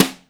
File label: good steel snare drum c key; tuned drums c key